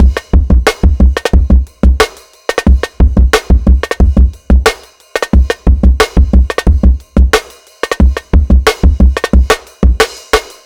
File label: Jungle Ride